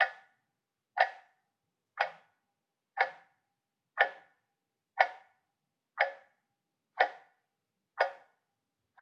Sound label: ambient port; World Of Warships; port3d